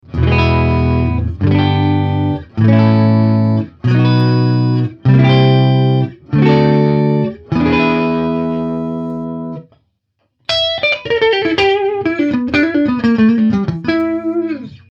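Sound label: alumin middle clean, strat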